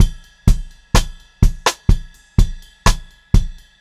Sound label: 126 Pop-Rock Drumloops; 126 POP 4